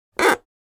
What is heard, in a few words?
Plastic squeak and creak; gfxsounds